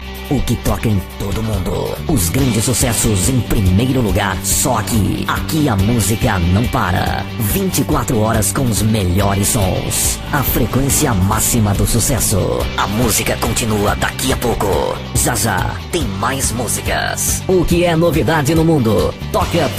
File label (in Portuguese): vinheta de radio; radio vu